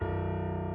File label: key; keySounds